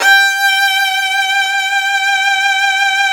Virtuoso Strings SOLO VN G4; ILIO; Kirk Hunter Virtuoso Strings VOL-1

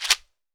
chekere; Africa

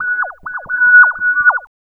FX LOOP; Big Beat Samples & More; AKAI; FX LOOPS KIT

